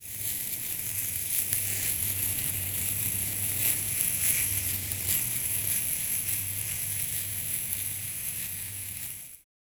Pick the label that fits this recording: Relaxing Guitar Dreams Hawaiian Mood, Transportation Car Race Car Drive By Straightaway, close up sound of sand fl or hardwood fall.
close up sound of sand fl